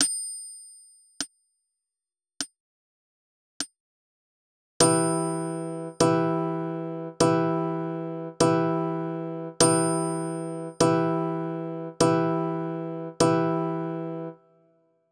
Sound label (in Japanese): 1,2,3,6弦和音; Guitar advice